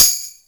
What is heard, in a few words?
TAMBOUR K, Ragga & Dub Reggae